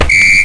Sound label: whistle